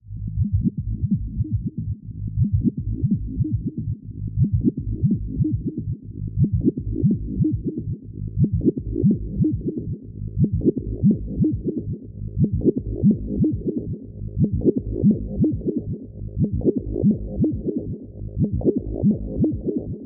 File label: Minimal Electro